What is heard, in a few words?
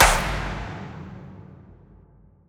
Stadium Impact; House; FX; Impacts